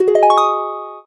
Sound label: door open; skater